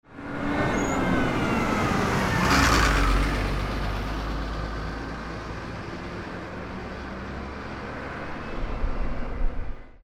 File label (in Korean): 버스